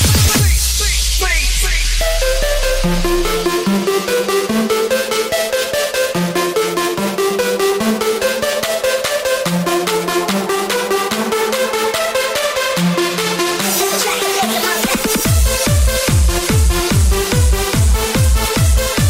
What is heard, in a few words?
Snap Rhythm